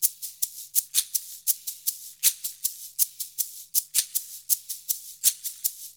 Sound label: 80 MIX, Percussion Loops